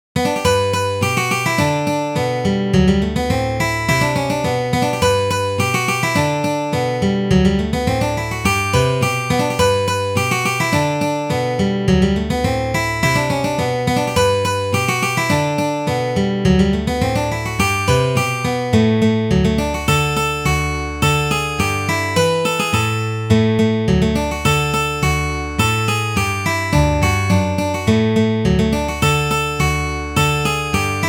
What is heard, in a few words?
Bluebell Polka